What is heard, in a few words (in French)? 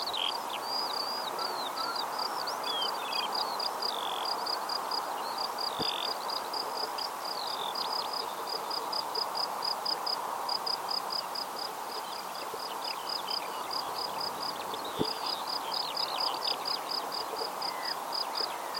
alouette champs grillons; fresnes